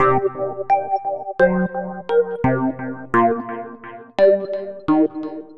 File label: Rhodes; Sonic Foundry (Sony Creative Software); Leo Cavallo Bass Taster